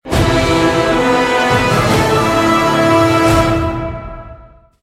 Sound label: Music Daemon Portal; Dungeon Punks; ps vita ps4 windows xbox one